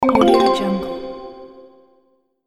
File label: level up pop